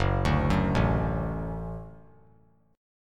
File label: F sharp Minor Sharp 5th F sharp; arpeggiated; JGuitar